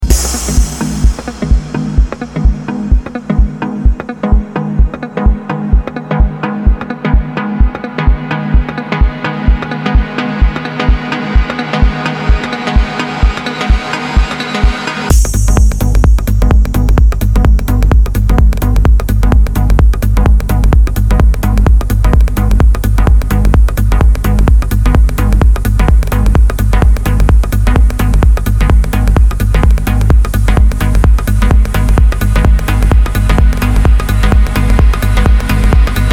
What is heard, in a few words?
Electro Thursdays